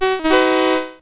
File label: victory; fargoal